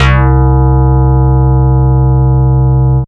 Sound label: Single Electric Basses, Dance Floor Bass